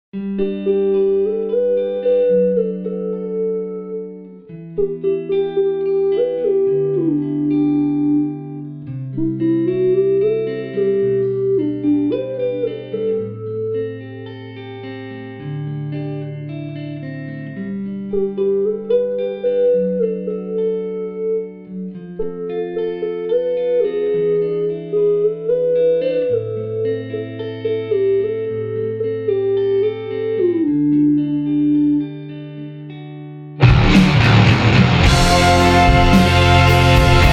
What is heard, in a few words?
Cute and heroic ballad and rock; Mood